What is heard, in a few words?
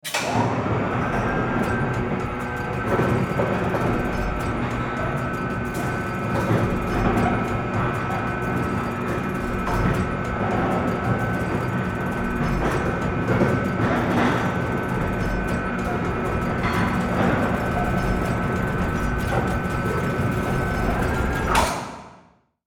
Ship winch cranking retracting